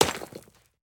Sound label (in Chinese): 滴水石锥落地声